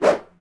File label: Axe Swoosh; Civ4 warlords